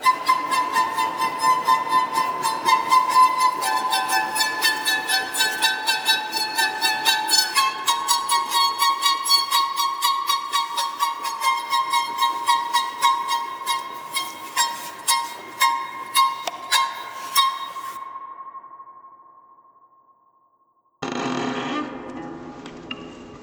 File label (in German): torch geige high